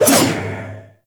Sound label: Ball Throw Foley; Warframe; Stalker